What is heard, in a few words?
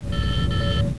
Empty Space; Blind Yoda Sound Scheme; star trek sound pac